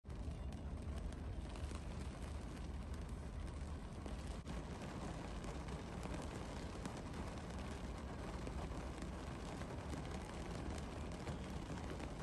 Rain inside car

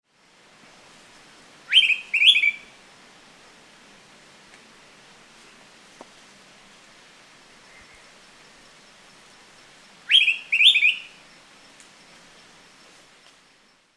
araripe manakin

